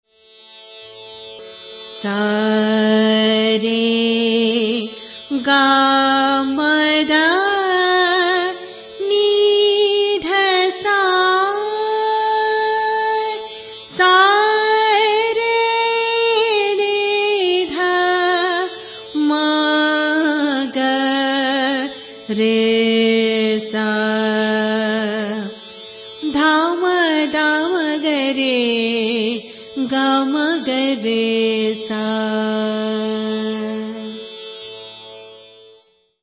marwa, raga

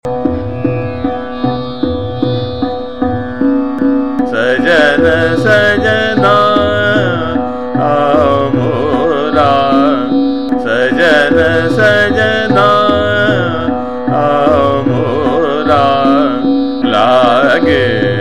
Chota Khyal - Trital, Bageshree; Sajana Sajna Mora

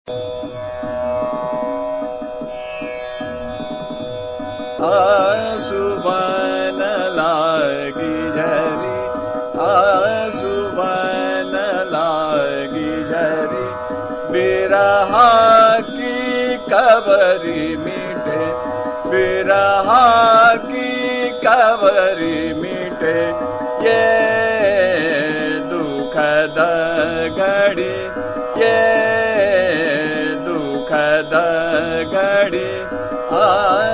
Chota Khyal - Ektaal Drut, Charukeshi; Aansuwan Laagi Jhari

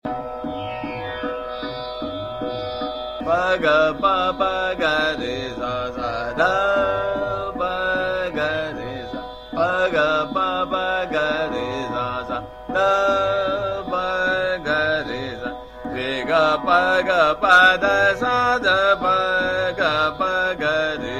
Sargam Pa Ga Pa Pa Ga Re Sa Sa Trital, Vibhas

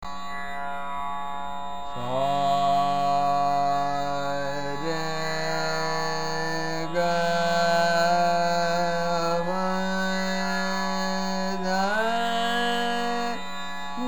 Bageshri Aaroh Avroh

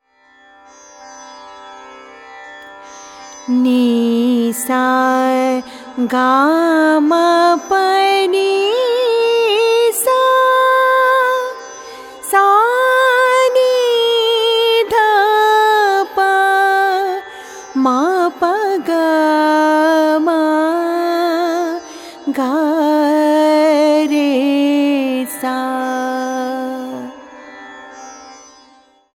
bhimpalasi, raga

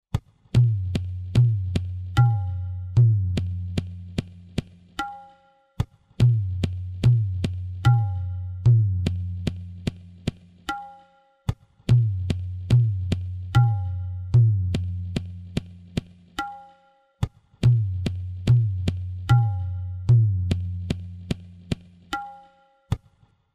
dhamar, tala

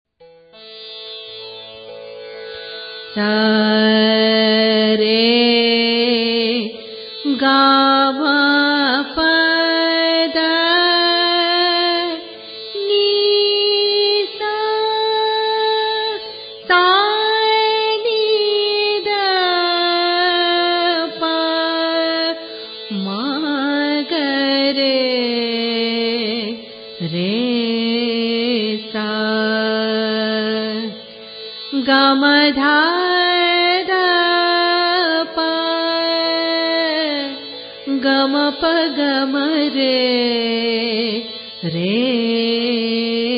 bhairav, raga

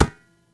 khol softka

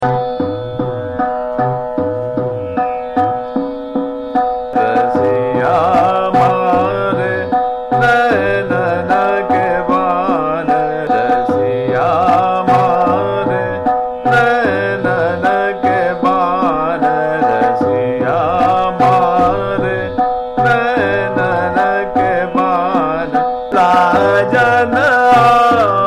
Chota Khyal - Trital, Purvi; Rasia Mare Nainan Ke

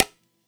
khol ti

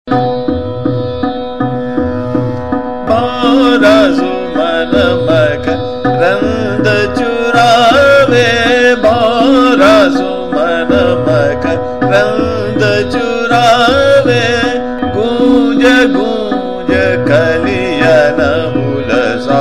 Chota Khyal - Trital, Bahaar; Bhonra Suman Makarand; tanarang

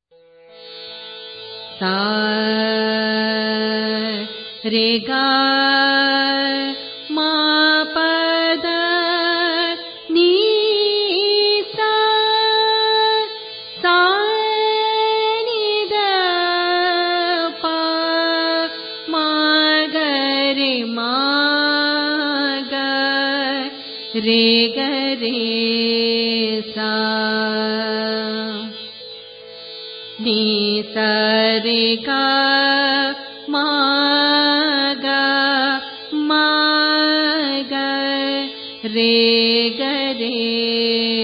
purvi, raga

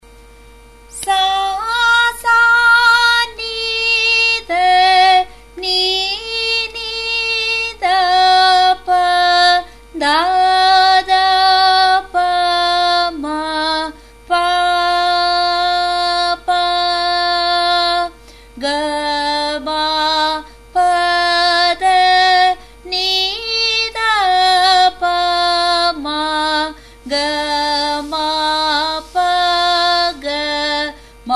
Sarali Varise, A sharp; Sarali 12

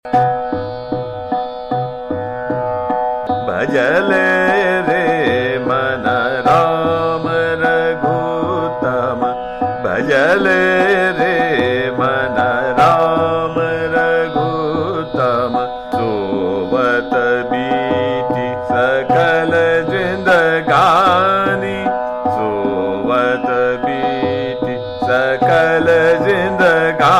Chota Khyal - Trital, Jogiya; Bhaj Lere Man Ram

